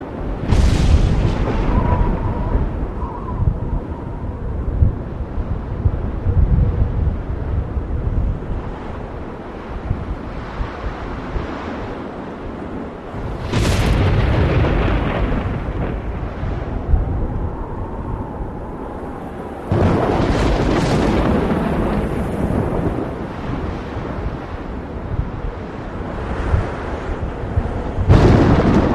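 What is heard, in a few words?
thunder and wind during a storm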